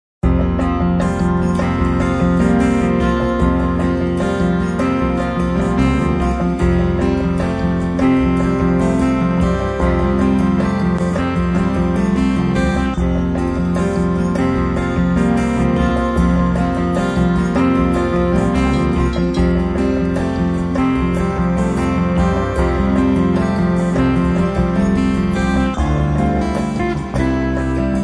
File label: Air, New Age; New Age Collection Vol.2